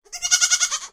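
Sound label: sounds of lamb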